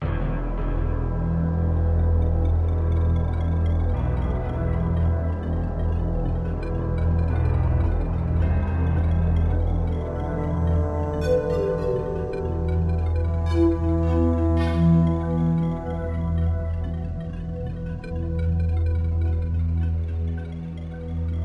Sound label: Music